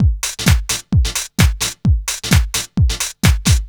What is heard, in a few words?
DRM 130, House, LOOPS 130; Best Service ProSamples vol.10 - House; AKAI